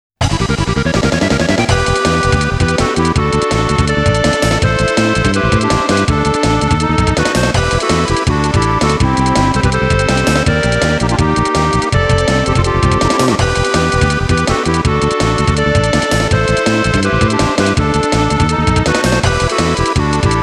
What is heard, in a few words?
Tense Atmosphere; Star Ocean; Radiata Stories; tri-Ace Sound Battle Collection